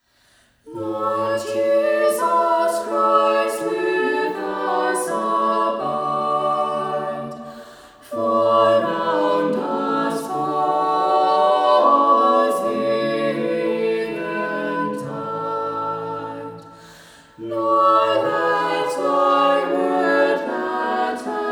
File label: Lord Jesus Christ with Us Abide